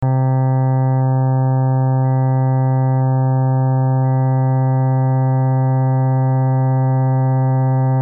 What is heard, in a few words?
cello